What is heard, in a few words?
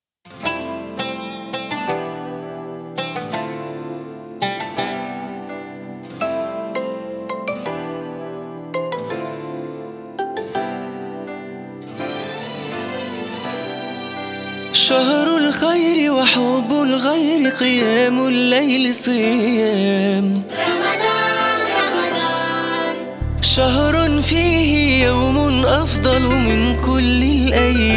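happy Arabic music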